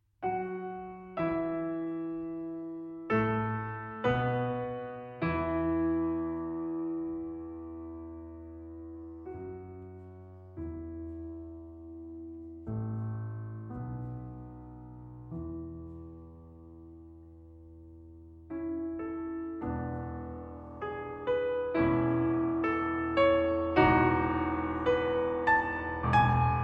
Piano Sonata in F-Sharp Minor Finale Sostenuto - Allegro Non Troppo